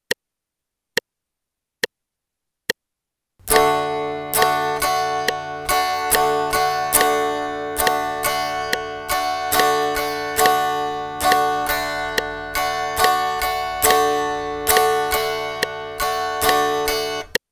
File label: D strum in eighth notes pattern 1, guitar